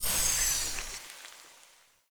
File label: Sticky Bomb Open Ready, Weapons; Grineer; Veilbreaker